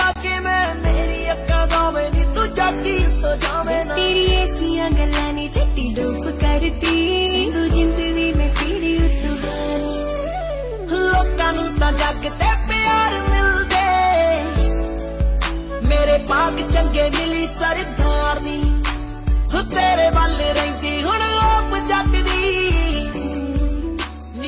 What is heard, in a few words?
english and punjabi sweet